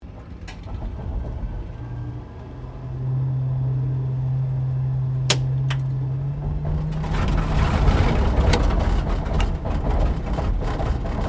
AIRCRAFT HAWKER SEAFURY STARTUP INTERIOR STEREO, fighter-bomber; Airplanes